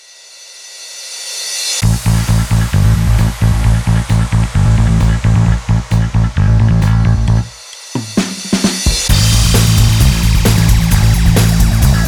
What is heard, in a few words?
cheerful no guitar; by burak sound bank; cheerful vol 10